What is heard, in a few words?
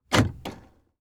Transportation Chevy Trailblazer Door Lock Interior